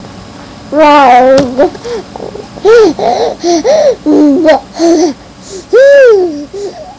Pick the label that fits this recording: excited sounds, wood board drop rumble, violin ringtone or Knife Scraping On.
excited sounds